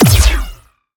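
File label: Sci weapon Shot Medium Blaster Punisher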